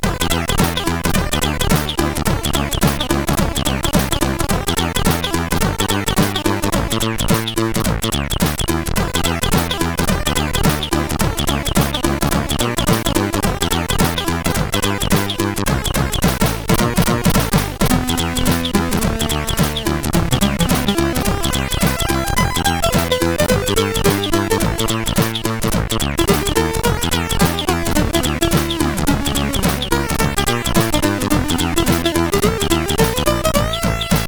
CSG 8580R5; HVSC; Fat Phitness

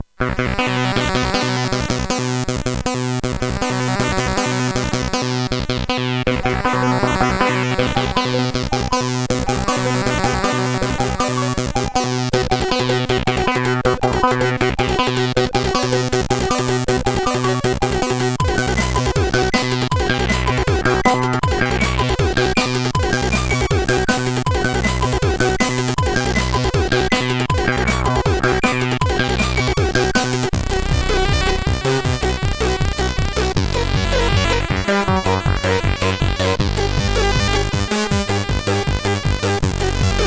Ambient, CSG8580R5; hvsc

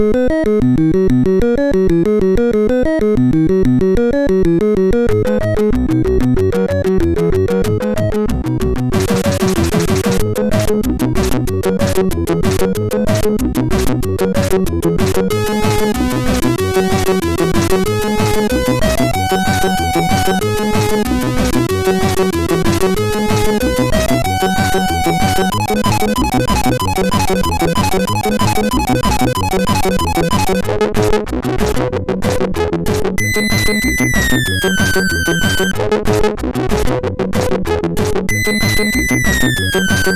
Ragtime, sid MOS6581R4

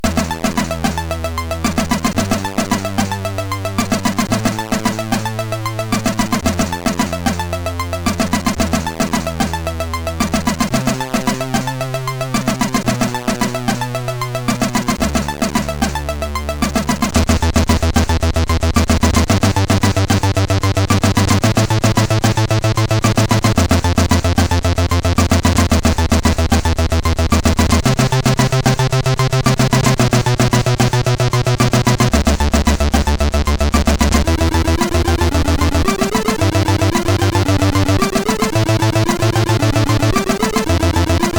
Tubular Dirge, CSG8580R5; HVSC; Ahz The Demon

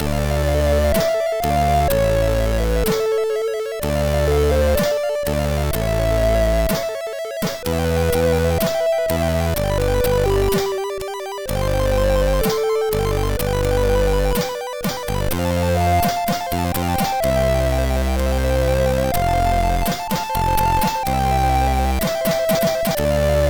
Intro Tune, MOS6581R3

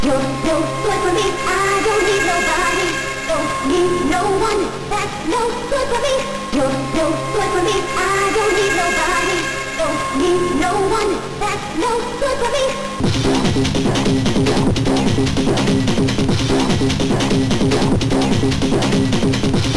Techno Mix, sid MOS6581R3; Dooshek

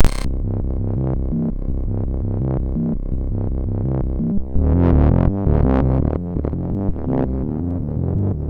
intro fx, MOS6581R2

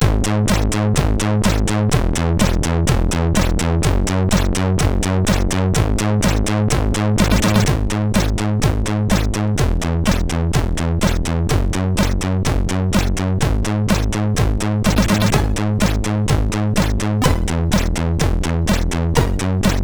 Disco Beat, MOS6581R4

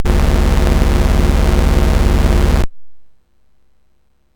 Dragonworld sid MOS6581R4

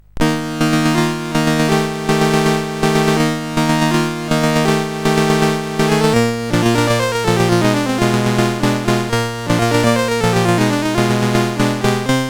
Fanfare, CSG8580

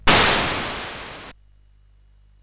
Paddle Mania, CSG8580R5

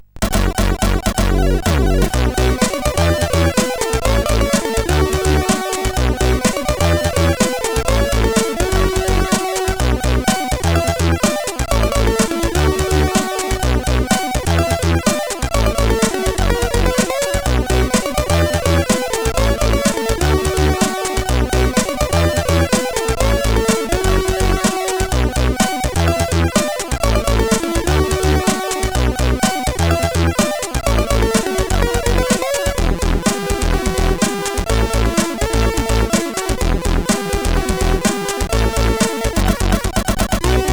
tune, sid CSG8580R5

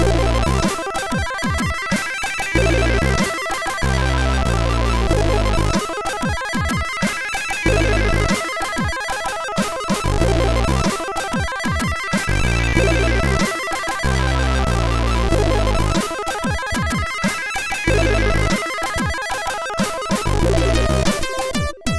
Doom Jazz, MOS6581R3

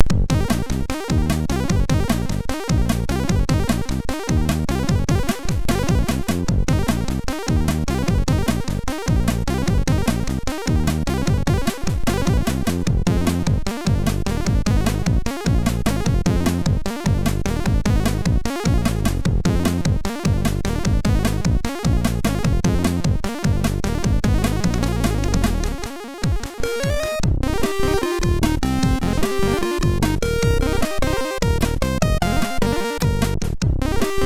MOS6581R2

